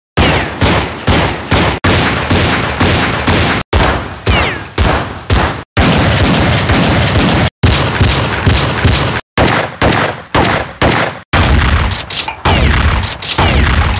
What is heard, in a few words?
Gun; بازی کانتر استرا